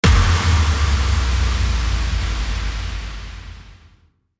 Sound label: IMPACT